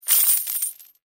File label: buy success